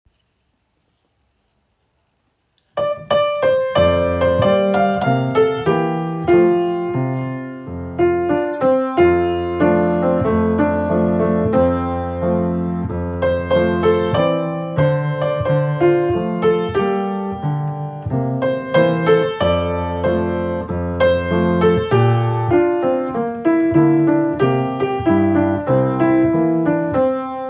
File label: piano